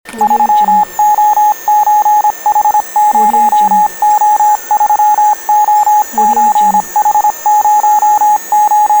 morse code

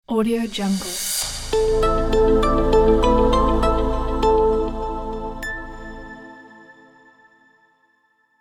simple logo

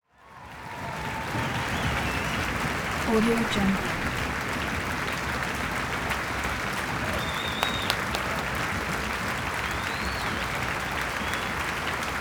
concert hall stomping applause

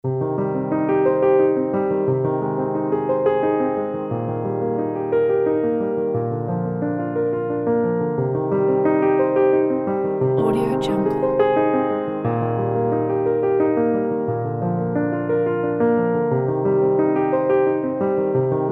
emotional piano arpeggio

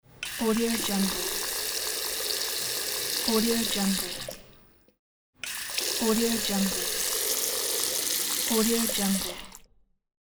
tap water flow

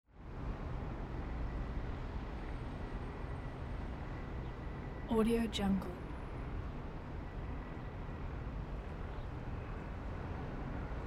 riverside pavement under the bridge in city

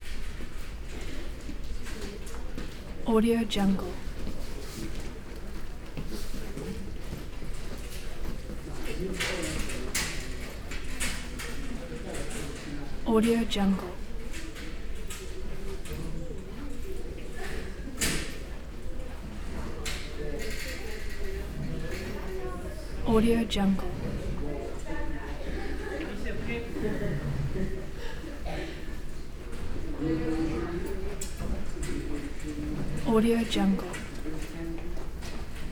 high school quiet walla lockers zippers metal

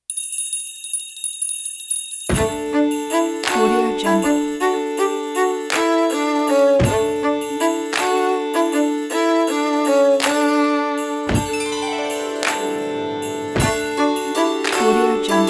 ukrainian christmas

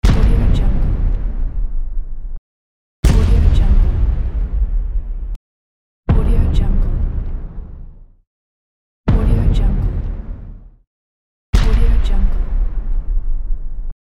boom impact

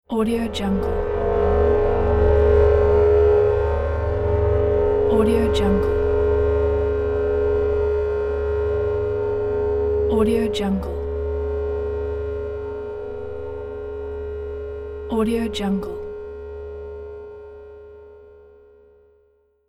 horror transition layer

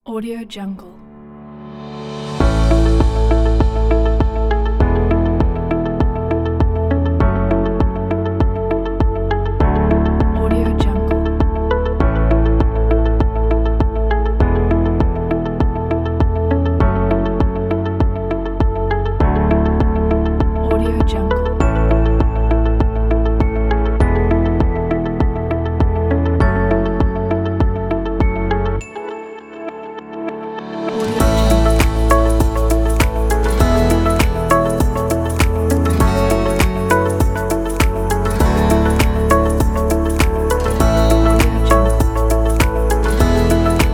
happy and uplifting presentation

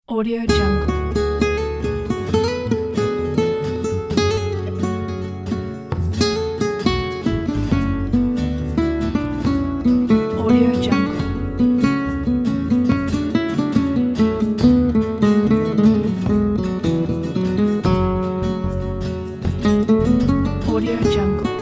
follow the emotion; ncmusic

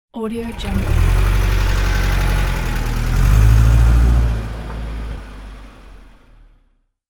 vintage car gentle drive away

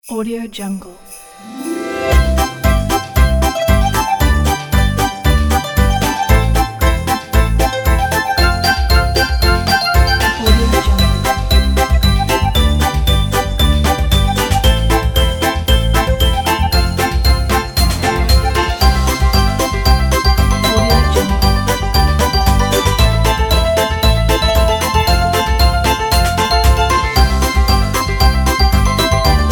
happy christmas

